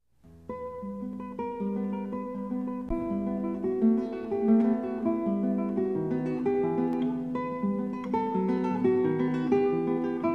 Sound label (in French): etude mi min, Guitare classique; sor 22